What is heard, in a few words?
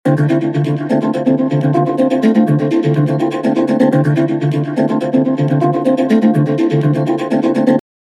Handpan; Basic Wavez; melodic handpans